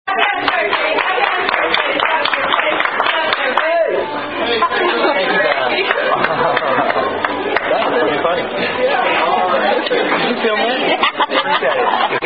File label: Happy Birthday (Tune Frere Jacques); Chili's